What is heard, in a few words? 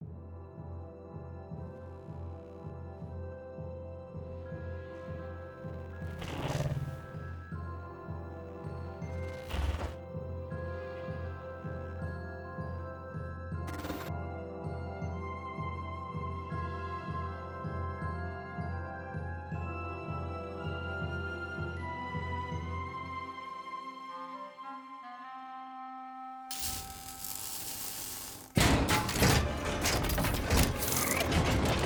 Ident SFX; lego the incredibles 2018 switch